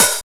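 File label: OHH, open hi-hat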